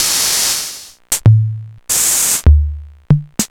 Virtual MPC Electronics

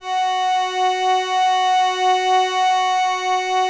LAMBDA, String Machines

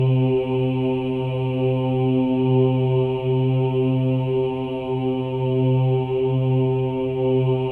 OHS, Symphony of Voices; Spectrasonics

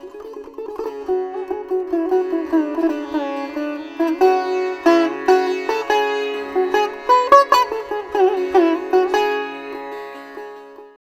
SITAR; Spectrasonics; Heart of Asia

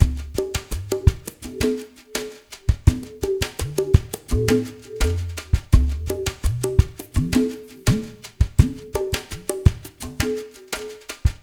REMIX; Spectrasonics; Liquid Grooves 1 & 2; SAUNTER

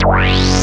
JUP8 FILT F1, Jupiter Synths; E-MU Formula 4000 Series Vol. 3; Analog Odyssey

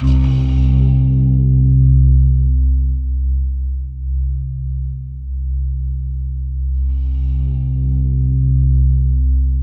C3, Synth Leads

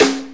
Rim Shot; Sonic Foundry (Sony Creative Software); Drum Tools

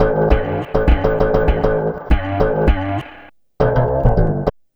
Diddly Bow Bass, Strings; Sonic Foundry (Sony Creative Software); Myths of Technology

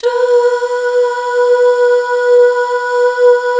Duh Fem, CH BACK; Best Service Gigapack 1

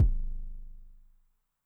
BD MOD Pitch Extra Low, bass drum, 909; classic machines; 909mod

